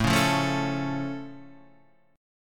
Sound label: A Augmented A, Guitar Standard; strummed; JGuitar; 5,4,3,2,2,5